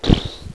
Off Belt; Recycle!

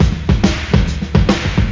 breakbeat; World of Sound; instruments; beats

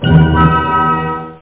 Dwarf Twinkle; Tesserae Demo; Card⁄Board

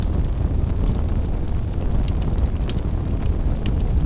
big fire; The Sum of All Fears

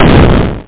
hard bump; ballistix

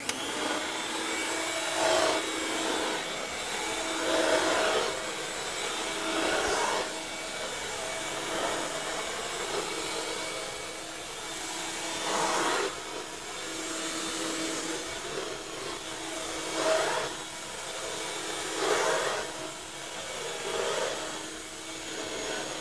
vacuum; Corel CD Power Pak: Sound Clips

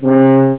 HORN

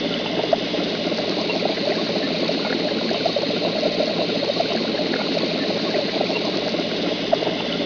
airhose; ambience; X-men

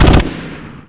sas rifle; Spec Ops 2

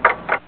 tictoc; McPoet 3.0

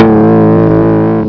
mothership; Invaders! 1.2

